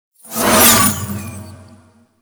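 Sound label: Projectile Whiz By; Warframe; Cephalon Weave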